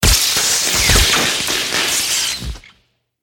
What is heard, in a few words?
Sparks Explosion Big Sparks Medium Zaps